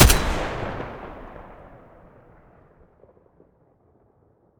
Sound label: ak12 muzzle mono, weapons; STALKER; IWP